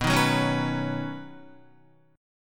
B Major Add 9th, Guitar chord; strummed; 6,6,6,6,6,7; 4F,4A,5C,5G,5C,6E; JGuitar

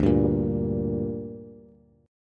D Suspended 4th Sharp 5th D down Bass, chord; strummed

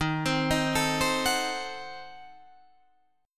D sharp Diminished 7th D sharp, Guitar, Drop D; 13,15,13,14,13,14; chordshape

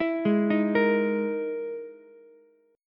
E-Major Sharp 11th E, Ukulele C6; EM#11 arpeggiated; JGuitar

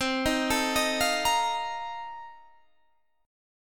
A sharp Major 7th Suspended 2nd Suspended 4th C

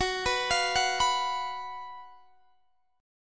F sharp Major 7th Suspended 4th, Guitar, Drop D

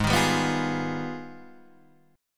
Gsharp Minor Major 13th; strummed; JGuitar

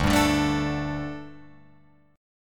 D Suspended 4th D, Guitar, Open F; strummed

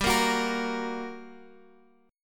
G Minor Major 7th, down

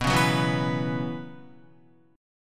B Suspended 2nd Suspended 4th, Guitar; strummed; JGuitar

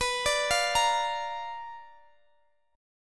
B-Minor Major 7th Flat 5th B down Guitar DADGAD

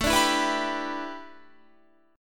A 7th Suspended 2nd Suspended 4th Guitar; A7sus2sus4 strummed

